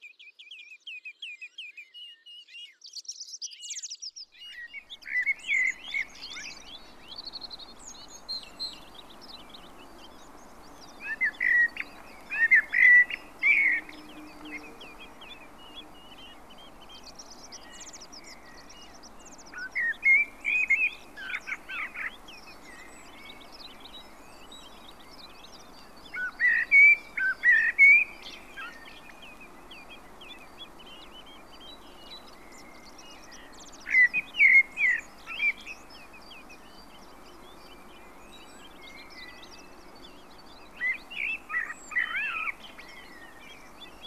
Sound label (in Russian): chernii drozd